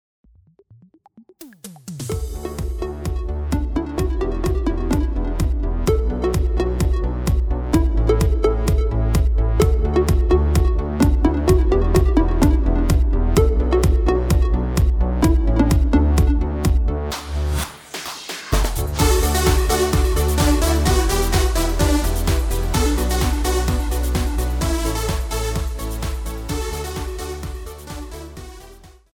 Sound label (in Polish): Kosmo Dance wersja instrumentalna